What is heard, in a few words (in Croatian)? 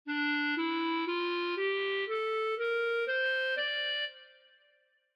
e mol, ljestvice, clarinet